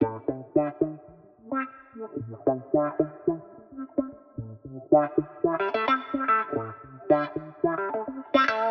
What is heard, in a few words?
110 A Future Wah, Spaced Out Guitars; Loops; Touch Loops; CosmicFunk